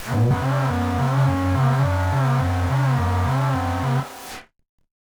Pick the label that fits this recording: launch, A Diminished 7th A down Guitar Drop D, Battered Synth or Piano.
Battered Synth